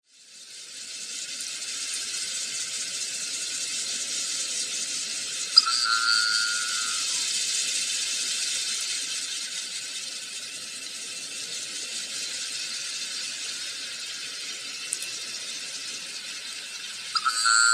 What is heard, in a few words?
minke boing, sounds of the sea